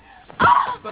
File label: sample, male laugh